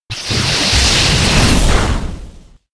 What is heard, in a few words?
shockwave